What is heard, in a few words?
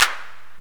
clap; 808 drums